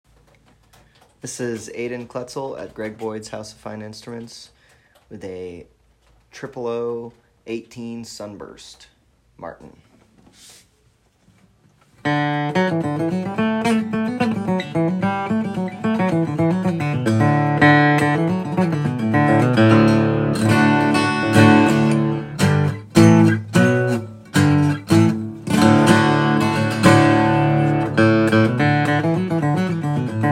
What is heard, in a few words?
OOO 18 Martin sunburst